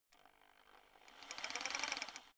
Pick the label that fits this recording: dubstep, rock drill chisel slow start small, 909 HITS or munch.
rock drill chisel slow start small